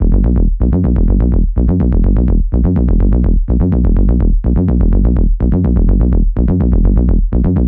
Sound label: Bass Loops E Min 125BPM; Unit Rcrds